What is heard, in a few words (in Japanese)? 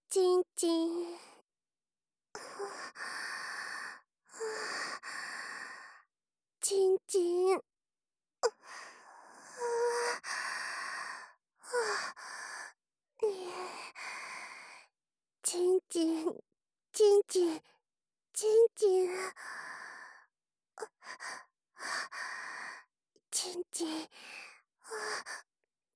喘ぎ